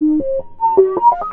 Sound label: robot start; Earth and Beyond; EA Games